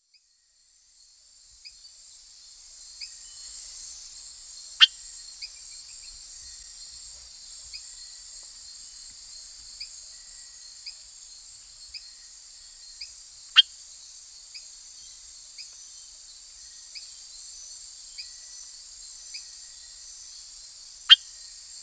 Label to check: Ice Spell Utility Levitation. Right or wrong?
Wrong